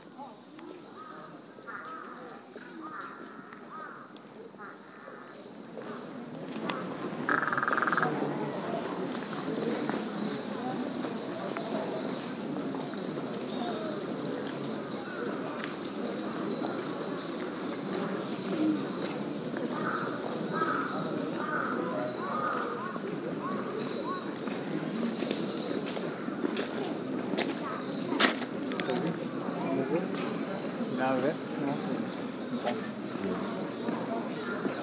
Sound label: Crows @ Meiji Jingu Gaien